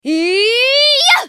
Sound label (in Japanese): 攻撃長; カリュケ